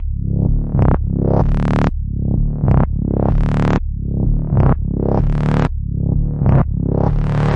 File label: 127 angry backwards hornets synth bass; SYNTH AND ELECTRONIC LOOPS